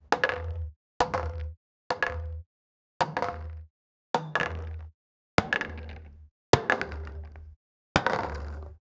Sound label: a high fidelity bowling